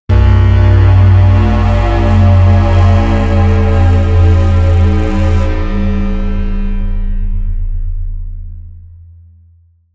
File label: Trailer Tools Bass Drop; Production Music; CATastrophic